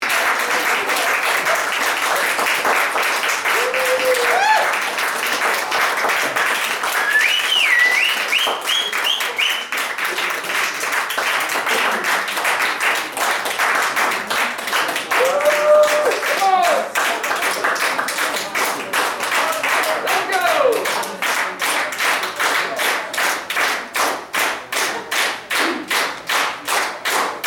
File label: Clapping